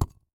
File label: button Click; butterfly staxx 2